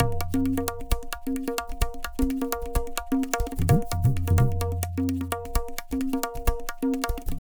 Tabla, 130 BPM; Zero-G; Pure Tabla

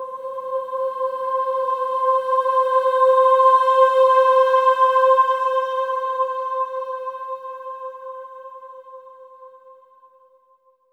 AH C5, swell ahs, Symphony of Voices; Spectrasonics

